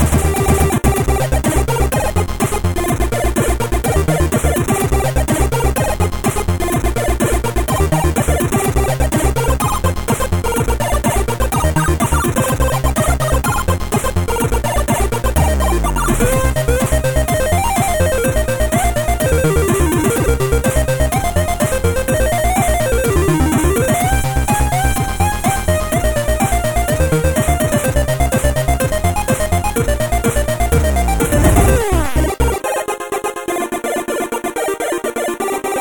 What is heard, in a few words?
chiptunes